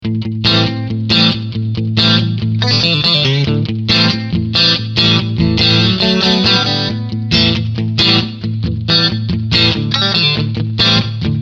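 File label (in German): Front Mitten Singlecoil Clean, guitar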